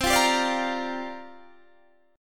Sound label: C 9th Suspended 4th C down